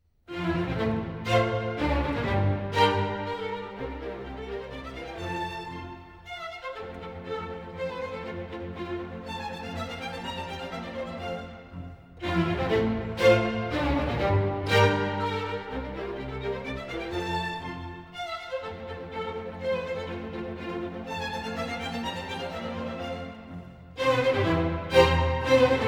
Minuets and Trios for string quartet, Menuett in F major